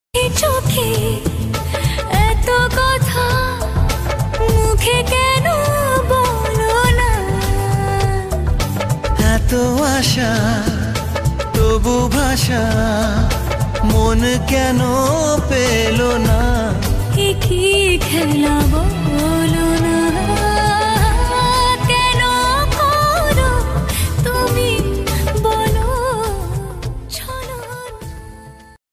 New Romantic Bangla Song Ringtone; ringtones

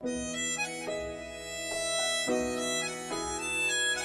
Shyam Bhajan Music Ringtone; Khatu Shyam

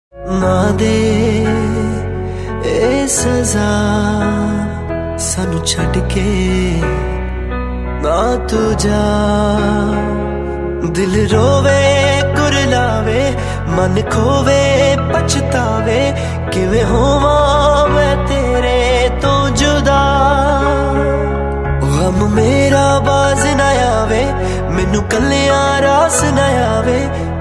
Sad Punjabi Ringtone

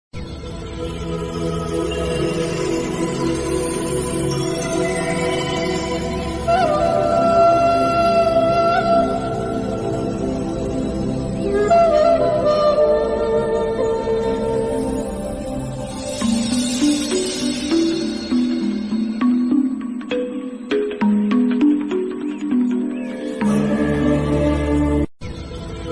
Flute Bgm Ringtone; Gautam Buddha

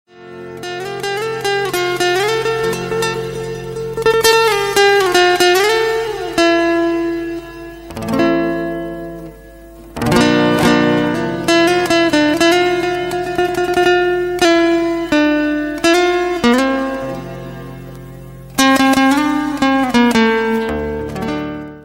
Romantic Ringtone

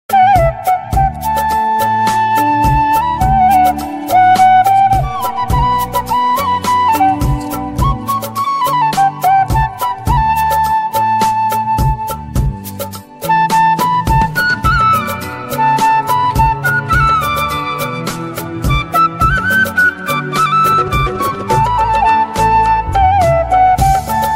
Flute Instrumental Ringtone; ringtones

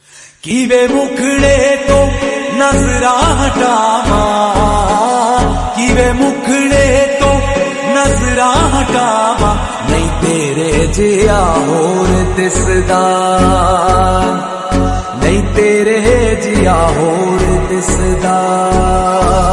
Bhakti Song Ringtone

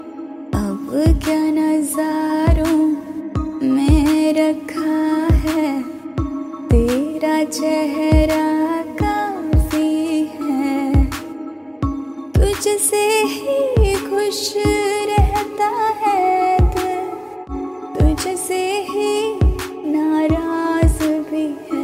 Romantic Hindi Song Ringtone

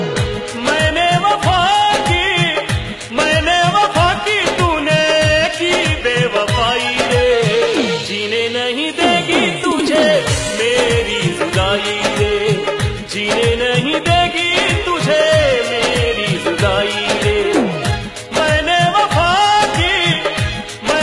Heart Touching Sad Song

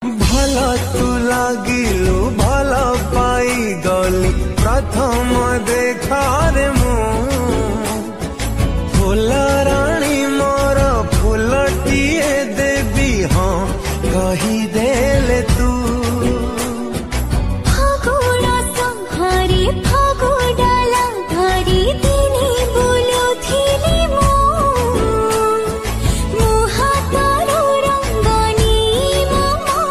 Odia Song Ringtone

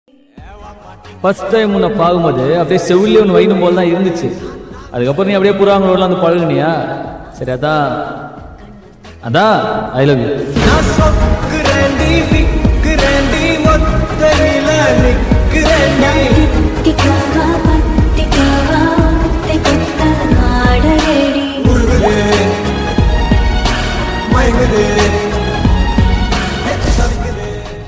Tamil Ringtone

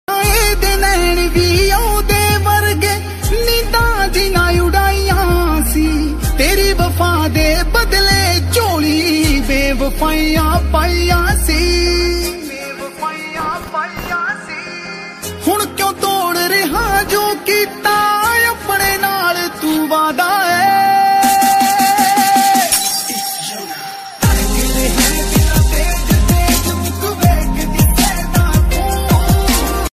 Sad Punjabi Song Ringtone

